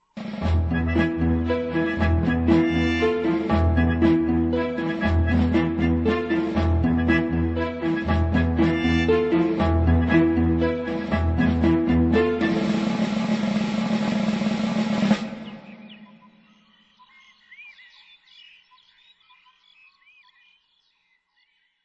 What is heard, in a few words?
banjo duel; plunder island